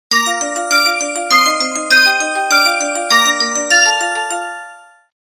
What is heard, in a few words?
Chiming